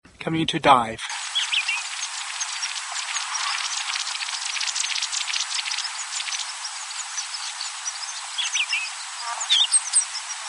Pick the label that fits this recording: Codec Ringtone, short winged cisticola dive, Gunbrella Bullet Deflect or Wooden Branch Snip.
short winged cisticola dive